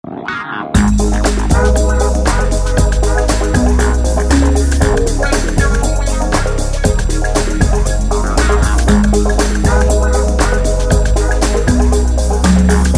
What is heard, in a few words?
techno